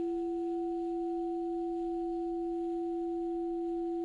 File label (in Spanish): Multiarmonicos